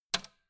old switch harsh snap hard

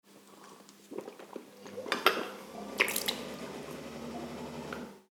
rinsing mouth with water

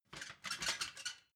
ladder metal handling soft

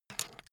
pen pickup

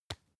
tissue tap soft

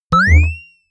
boing

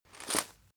chain mail movement

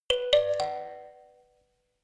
notification kalimba rattle triad up minor high

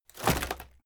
wood break drop

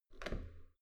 metal door heavy handle up soft

